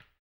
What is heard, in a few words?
HAMBONE, Vocal Blues Soul; Spectrasonics; Planet